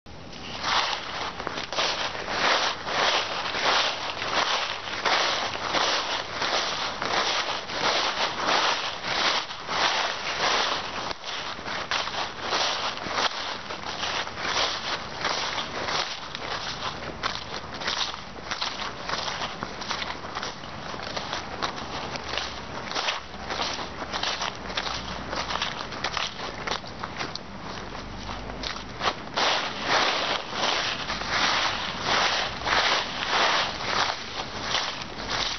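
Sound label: rustling leaves, nature sounds; shum prirody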